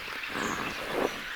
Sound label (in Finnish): enta voisiko tama olla siperianrastas